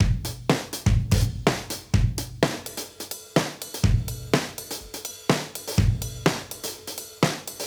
Modern Breaks; Touch Loops; ClassicBreakSessions

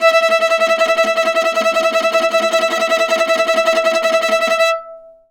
VIOLA TR E4A, Viola II Tremelo; Orchestral; DirectWave; Varazdin Orchestral

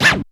SCRATCH; FX PERCUSSN

